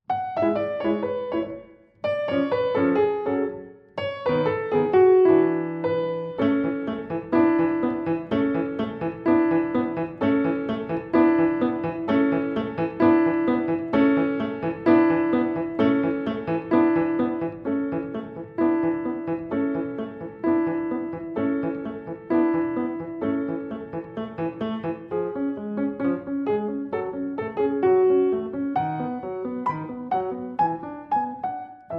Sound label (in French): instrumentale; les deux pigeons